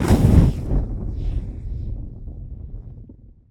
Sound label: In Fire; KriptoFX; Realistic Effects Pack v1